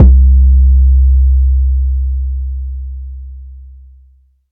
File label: phonk kicks oneshot - 808 C; kick drum